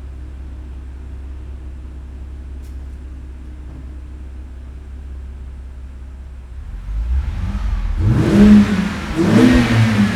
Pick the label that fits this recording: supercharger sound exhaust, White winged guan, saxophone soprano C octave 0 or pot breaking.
supercharger sound exhaust